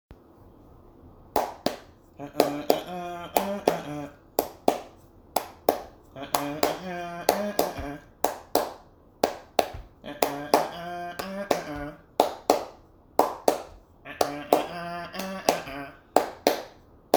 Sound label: lion vs wolf beat